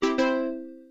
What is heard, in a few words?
slot machine win